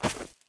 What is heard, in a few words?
dirt, player footsteps; hl2